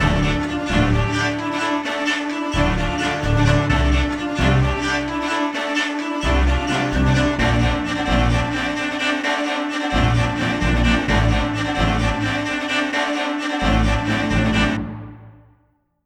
Combat; Skellige - Ice Giand Island

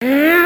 windup

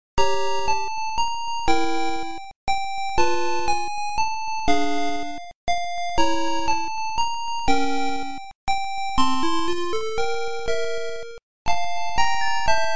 Little Miss Muffet - Song; Mixed-Up Mother Goose

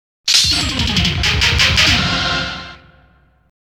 COIN; Ninja Warriors

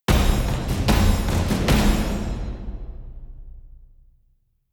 Combat Out; Skellige

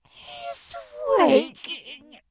hes waking

